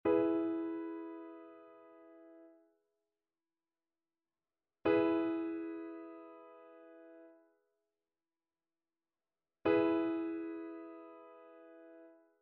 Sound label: trichord; trichord identification